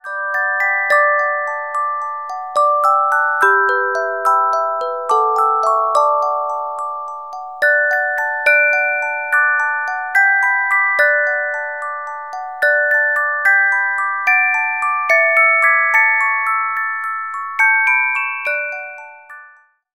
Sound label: Carillon Music Box Melodies